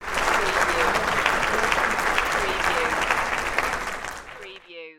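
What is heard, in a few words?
Large Crowd Applause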